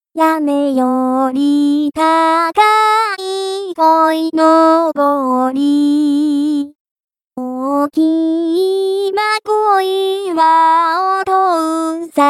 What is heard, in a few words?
vocal only